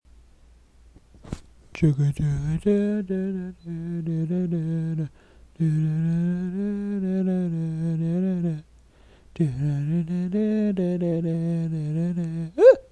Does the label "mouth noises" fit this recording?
Yes